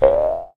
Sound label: Trampoline; angry birds